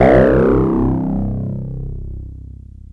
SCRUF BASS C2, Yamaha TX-81z

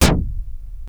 snare, Chroma Polaris

